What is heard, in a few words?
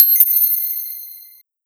bingo Twinkle; bingo Card App